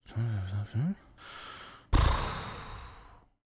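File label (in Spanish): BALBUCEO RESOPLIDO, babbling snort; yesterday origins gamerip 2016 switch ps4 pc ios macos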